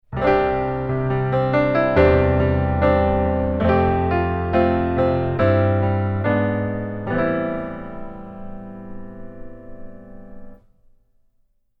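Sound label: keyboard piano low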